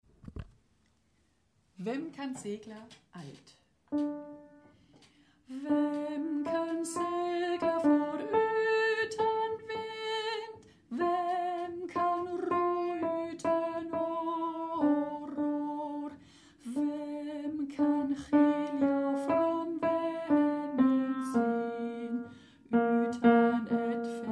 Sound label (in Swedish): Vem kan segla - Alt; Chor; chor ag